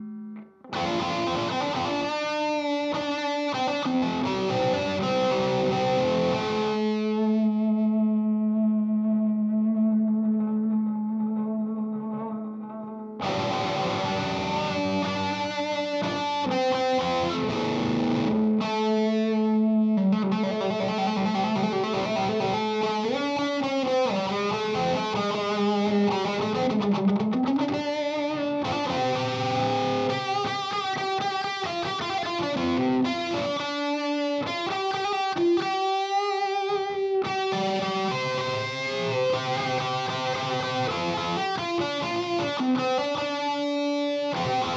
Chime; Strymon Iridium

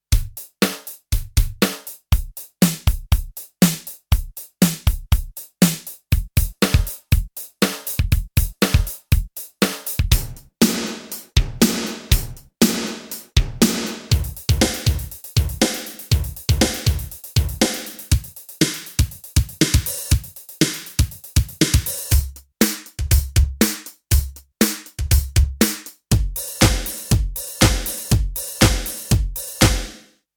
R8 816 Beats

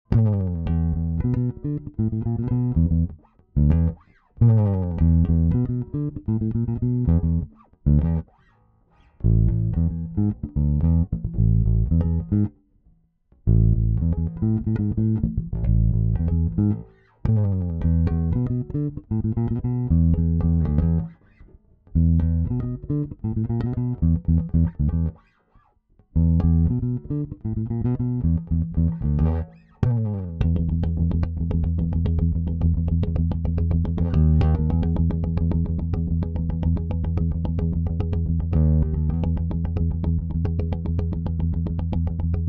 active fingerfunk rolloff bassboost

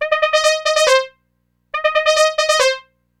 brass

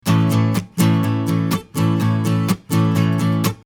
Neumann V476 Acoustic Guitar Strumming 20cm U87

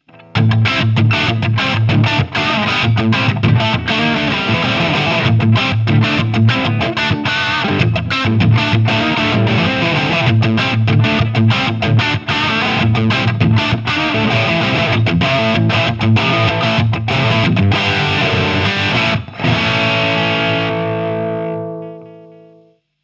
Friedman DS Blue Channel

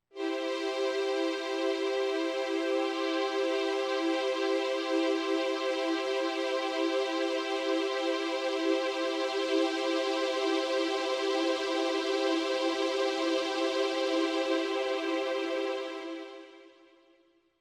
OSMOSE phase machine; EXPRESSIVE E

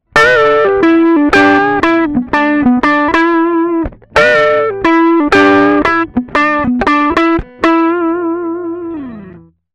Hi-Z Drive; RME

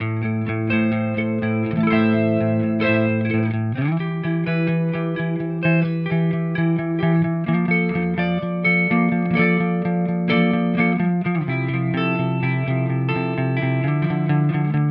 Fender neck clean

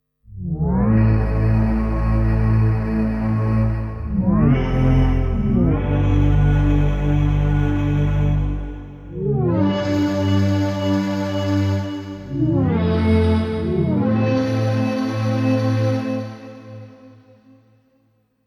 Elastick Pad

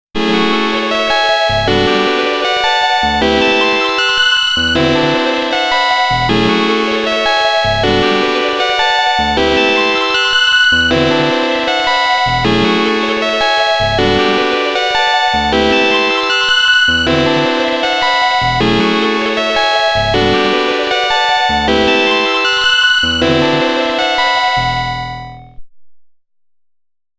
Dreadbox Polyend Medusa; Final Fantasy

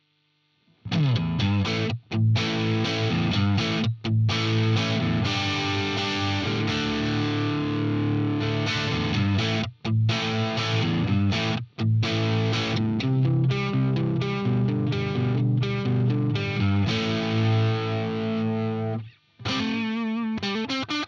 HB JA 60 dist neck

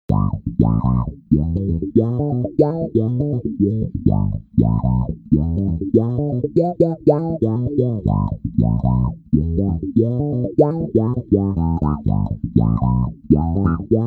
qtron

